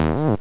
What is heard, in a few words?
Bass Boing; SynBass